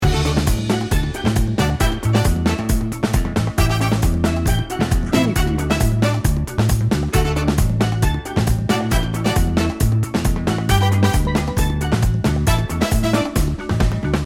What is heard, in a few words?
tropical celebration loop